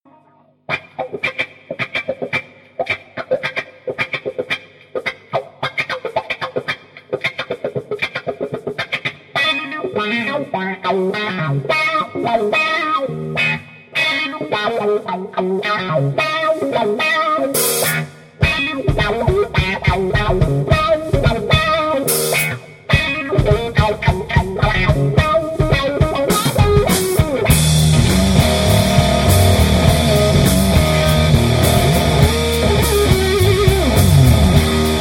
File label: Gypsy Wah Live; Chase Tone